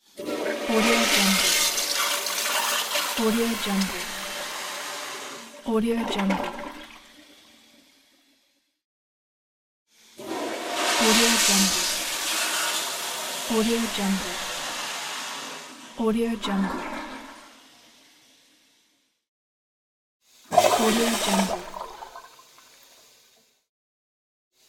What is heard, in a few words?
toilet flushing sounds